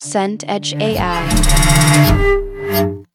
cello bow swell

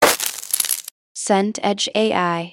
packet crisps open

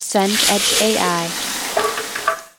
water pour bucket

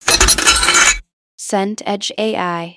deadbolt throw

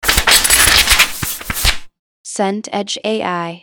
paper shuffle

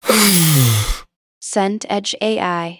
breath sigh out

